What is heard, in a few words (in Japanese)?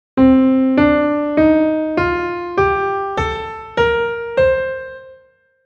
ドリア旋法